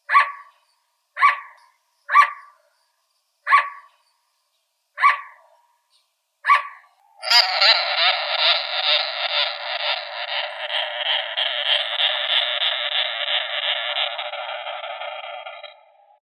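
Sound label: flying squirrel, Pteromys volans; letyaga obiknovennaya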